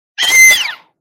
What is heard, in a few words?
spatial weird drill